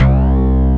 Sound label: Deep JD 990, baesse